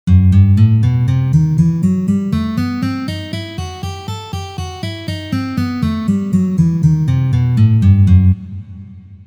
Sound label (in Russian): Gamma Mi minor garmonicheskij vid pozitsiya